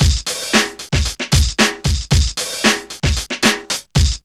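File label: 114 bpm, Funky loops; Zero-G; Funky Elements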